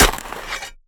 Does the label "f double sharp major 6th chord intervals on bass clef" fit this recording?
No